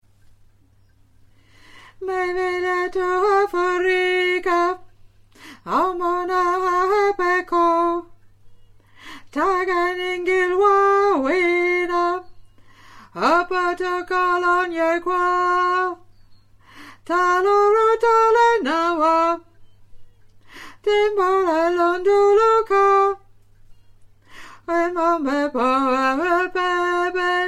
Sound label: Bass